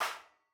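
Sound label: CLAP STACK LOW LOW CLAP STACK, analog recorded drum; Sonimus; s series drum pack; STONEQ 4K; SATSON; SATSON CS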